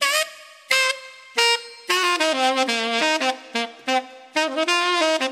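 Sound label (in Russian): одиночные звуки и быстрая мелодия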